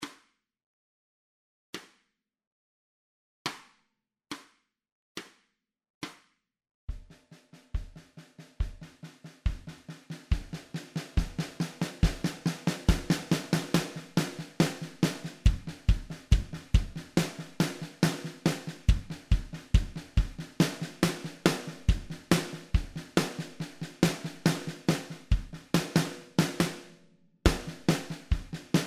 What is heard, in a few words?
Snare